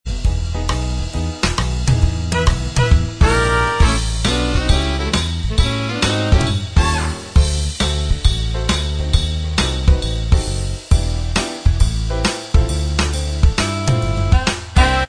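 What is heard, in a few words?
instrumental track; from hairspray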